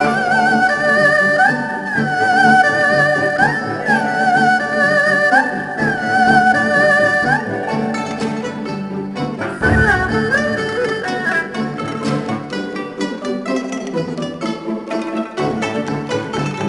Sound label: BGM Mini Game; Tienka